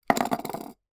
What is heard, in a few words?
household pocket watch put down on table and wobble